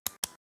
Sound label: Wild Lock; ZTE Nubia RedMagic; ui